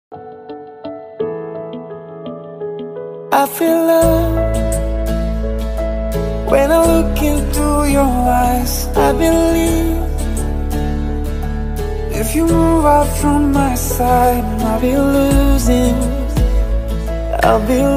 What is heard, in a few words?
English Romantic Ringtone